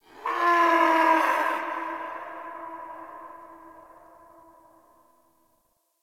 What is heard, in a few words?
Distant Creature; Ambience; Warframe; Eidolon Plains